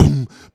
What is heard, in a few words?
Beatbox Kick, Human Beatbox; Vengeance Samples; Special Sounds